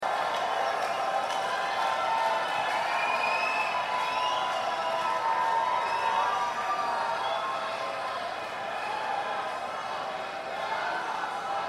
Crowd

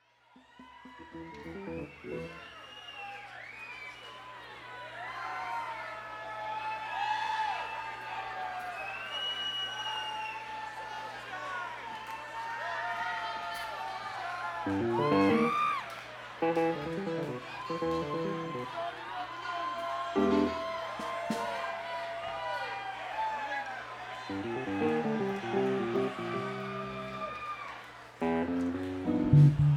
Crowd

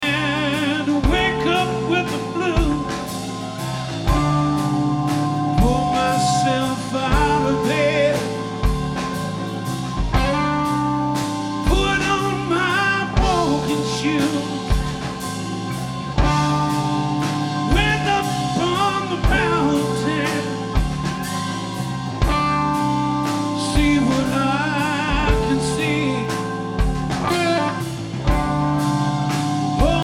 Drums

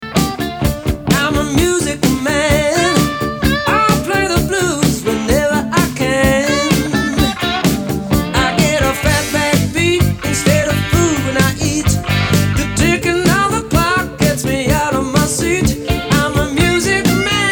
Rhythm Machine

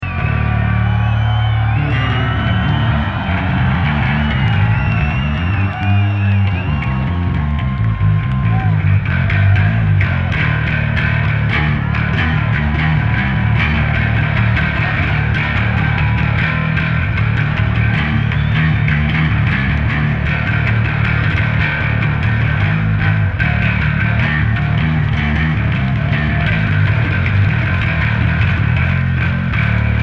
Bass Solo

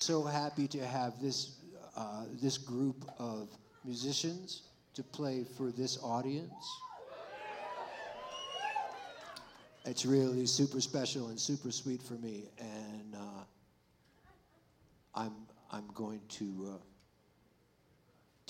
tuning

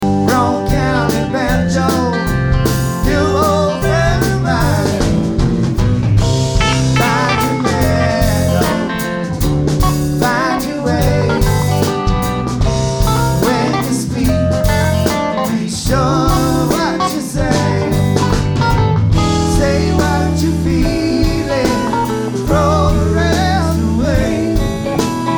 Banjo

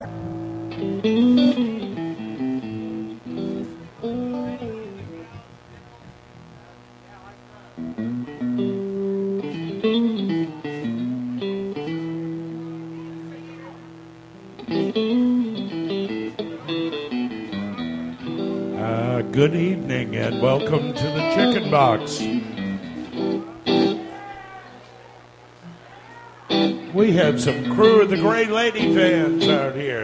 tuning

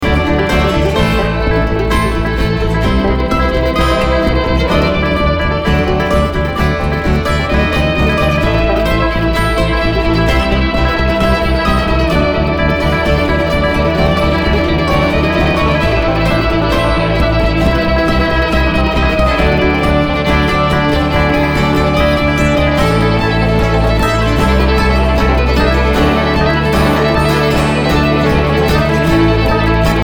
Traffic Jam

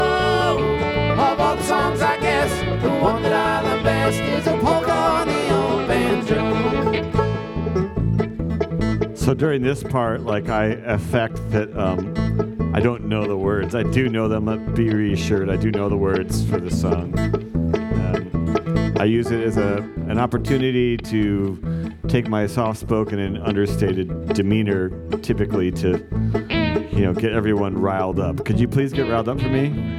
Polka On A Banjo

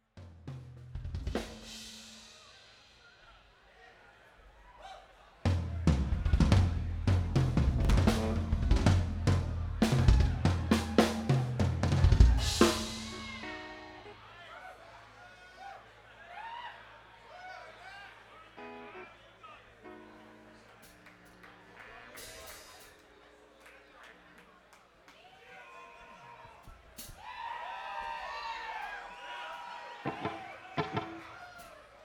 Tuning

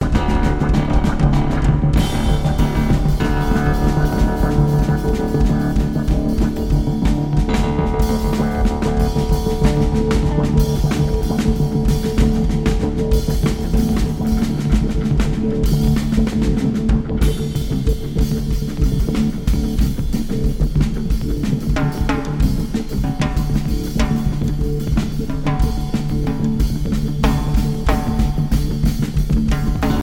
Drums

